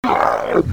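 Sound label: zombie hurt heavy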